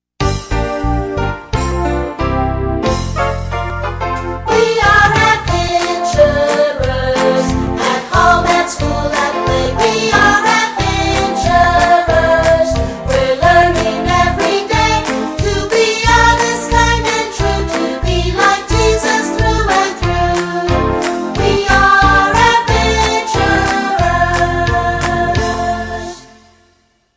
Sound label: Adventurer Song